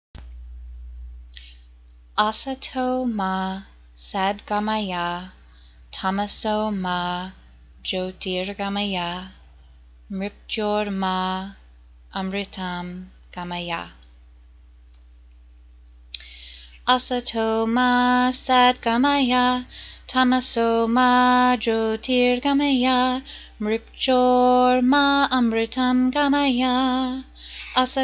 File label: asa to ma chant